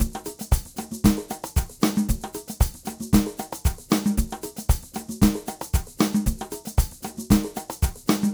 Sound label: Ensemble, Latin Percussion